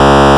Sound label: Pitfall C#1, Glitch Toys LoFi Samples; Ableton Foundation FreePacks